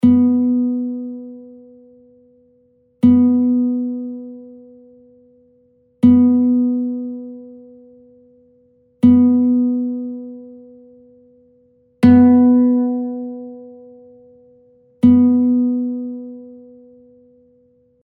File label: Bandurka G strij B3; 2-га струна – сі, B; 1-ша струна – ре, D